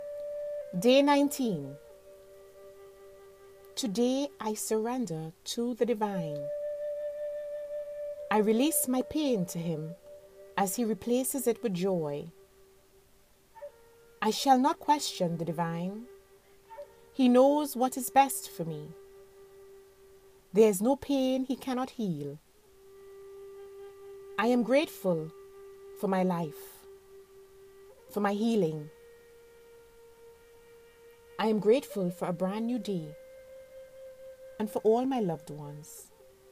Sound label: healing mantra